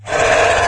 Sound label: attack, imp; doom3